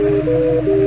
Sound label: transporter; quake2; mrbo